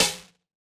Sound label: Yamaha DJX 2B, SD Hard